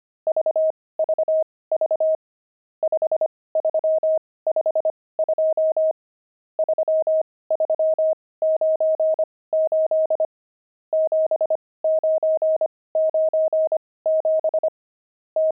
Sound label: pangram, intermediate pangrams files